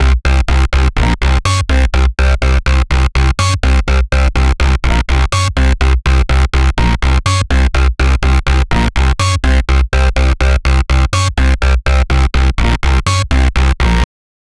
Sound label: a fat electric funk bass